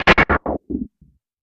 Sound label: Air Noise Delay Flutte, stinger